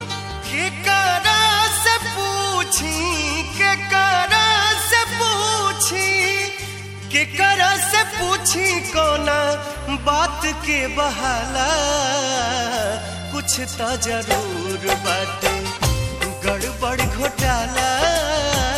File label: BHOJPURI RINGTONE